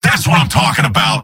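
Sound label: positive vocalization, male; tf2; garrysmod; mvm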